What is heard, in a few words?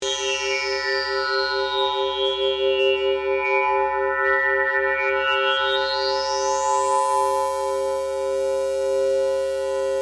Pulse Ray Long Beam, Scifi; Science, Fiction; Battle Sound Effects; BlastWave